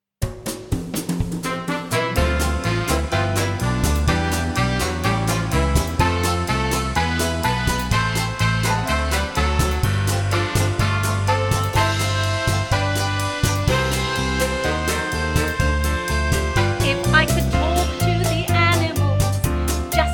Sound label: with VOCALS